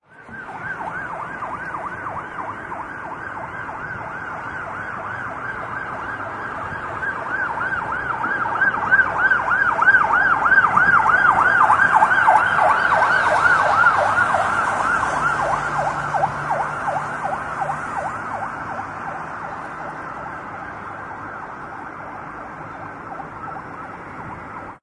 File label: Police car siren sound